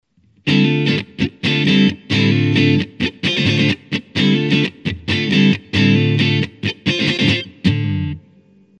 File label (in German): EQ High Boost Strat Hals PU Yamaha